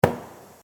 Autowerkstatt Schlag

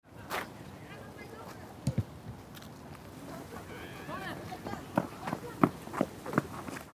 Fussball Jungendtraining Spielszene

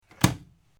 Kaffeemaschine schliessen

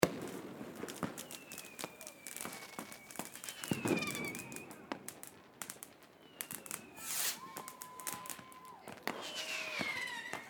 Silvester Funkenregen

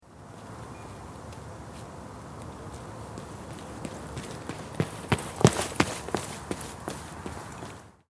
laufen

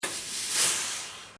Luft zischen

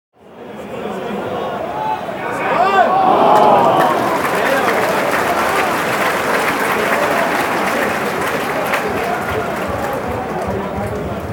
Stadion Fan und klatschen

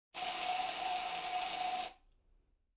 Im Badezimmer Mundspray

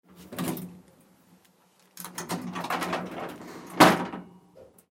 Fahrstuhl Faltuer schliesst

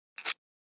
Streichholz

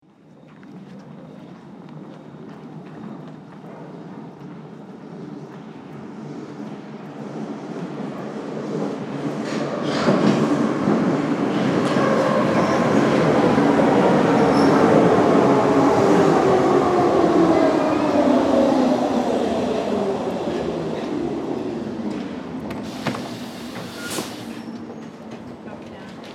U Bahn Einfahrt

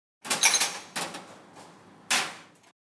Espressotassen

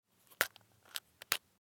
Lutschbonbon

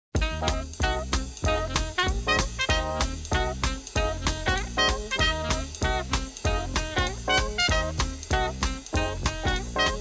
Stimmungen Bepop

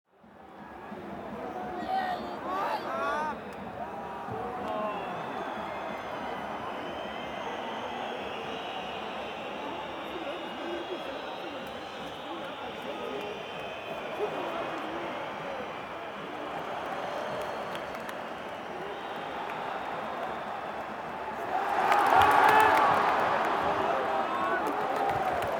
Stadion Atmosphaere

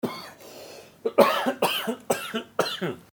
Husten

